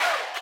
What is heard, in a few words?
crowd